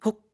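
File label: Climb, voice; Link; BOTW; Breath of the Wild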